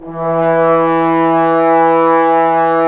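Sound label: trombones; Roland LA Composer vol. 4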